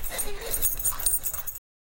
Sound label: newborn baby laughing